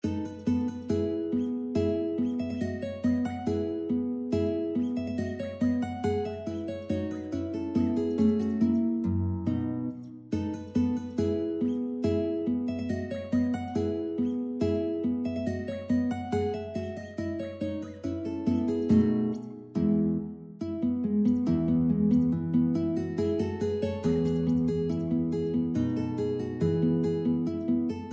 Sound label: minuetto in e major, guitar